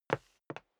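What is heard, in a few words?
step dress boots wood parquet scuff, footsteps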